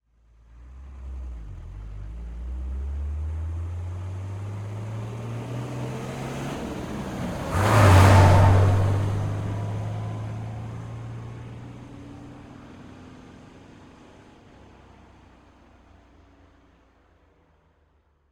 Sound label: Truck Unimog EXTERIOR Pass By Fast Accelerating Gearshift; Mercedes-Benz Unimog - 437. U2450 4x4; MKH8040-MKH30; Dramatic Cat